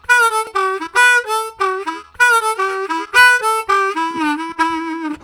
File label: Harmonica, WAV Riffs; Zero G